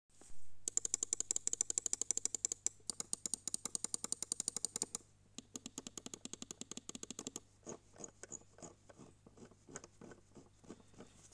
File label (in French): glorious model o wireless bruit; tests peripherique overclocking